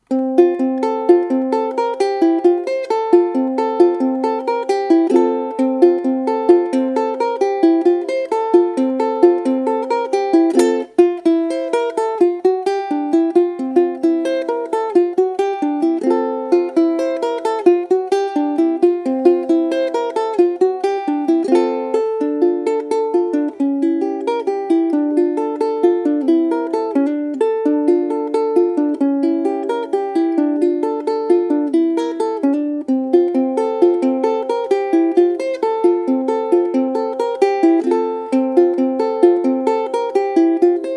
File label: Cabral Waltz, ukulele